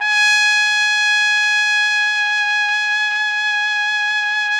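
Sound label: TPT, trumpet; Roland - LA Composer vol. 3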